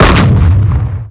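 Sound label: Heavy Tank Cannon Normal Attack; blue archive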